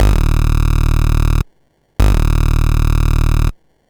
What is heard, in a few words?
Sh09 Bass Loop, 120BPM; musicradar; bitcrushed; Crushed Loops